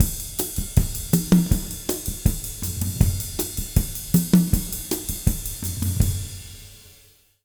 160, Jazz Latin Drumloops

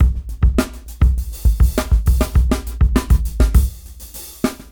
101 bpm; AMG; Keith LeBlanc Phat Butt Jams

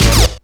stabs; stabs & hits

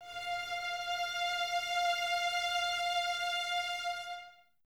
Violins F 5, String Ensembles; Full; 23 Violins; Miroslav Vitous

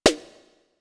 Snares, Elektron MachineDrum SPS1 MKII

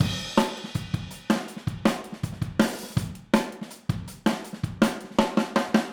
Fill Overhead, Drums, 162 BPM; Drums from the Big Room The Mixes; Take Your Toms Off

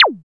JUNO ZAP C7; HIQ+ZAPS

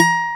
SPAN D4, NYLON G; AKAI S-Series CD-ROM Sound Library VOL-1

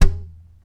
TAP, FLAMENCO Guitars

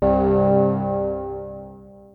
PPG C2, Rare Synthesizer; Hollywood Edge; Giorgio Moroder Rare Synthesizer Collection; PPG.2.3

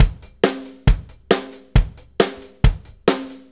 4-4 Loop, Drum Loops, Full Kit Loops

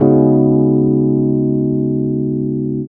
RHODES; Houseworx

